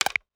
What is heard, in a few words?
Message